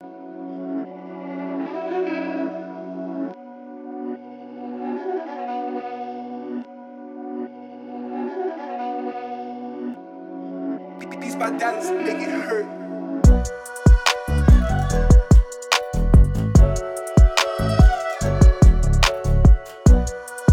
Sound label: stock music